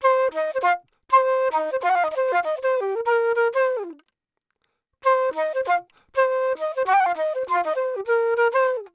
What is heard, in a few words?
FLUTE